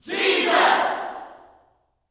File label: JESUS, Gospel, YELL SHOUT; Spectrasonics; Vocal Planet